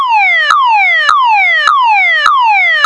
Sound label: siren